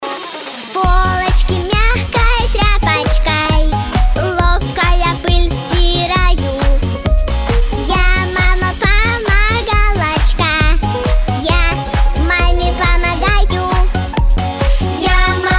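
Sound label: vocal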